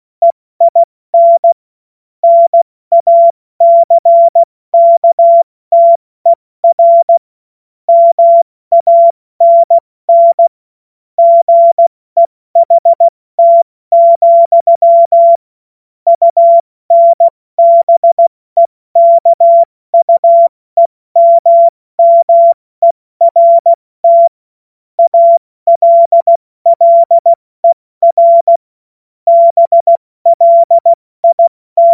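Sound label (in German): Witz, cw morsen; Morsekurs; Witze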